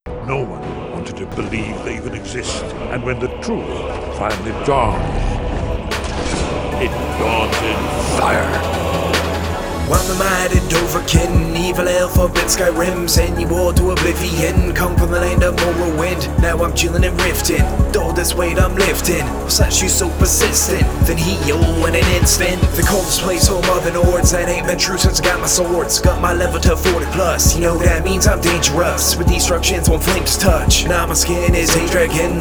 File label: rap; skyrim